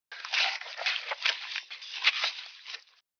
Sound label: dig; Revista CD Expert 45; ZEUS